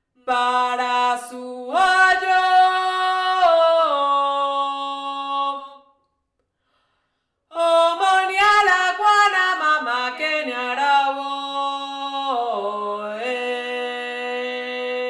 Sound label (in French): BARASU AYO VOIX; afro cubain